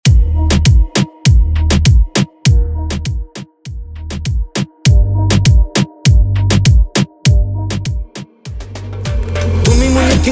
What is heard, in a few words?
Intro Outro 100BPM